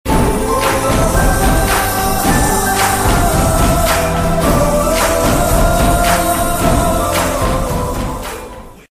humming

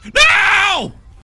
Screams Noooo

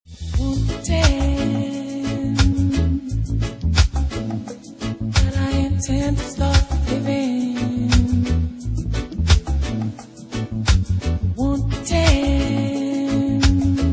reggae cover

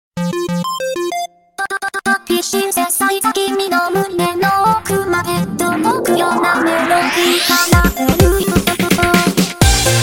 Happy Synthesizer

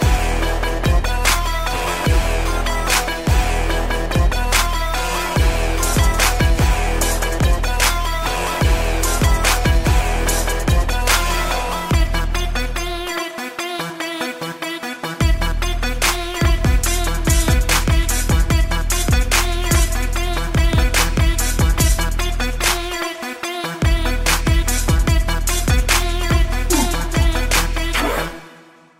Big Trap

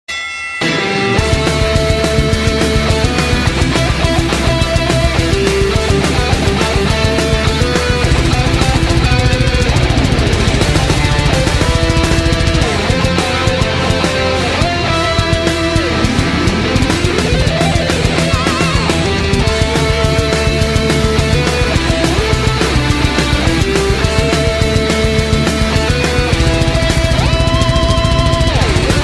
Metal Cover; Rocky

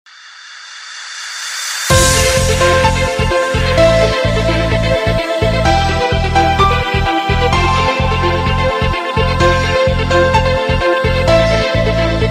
super turkish tune

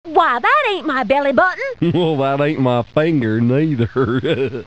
belly button song; children

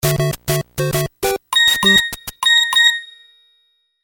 mario bros nokia

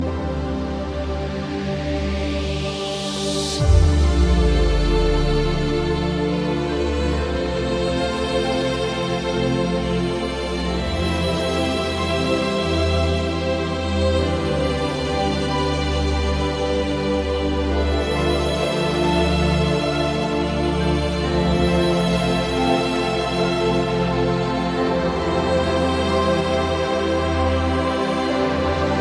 violin trance; classical

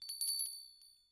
Bell

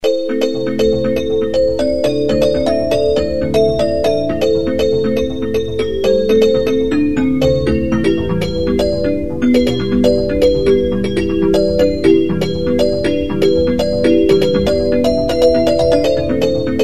melodic tone

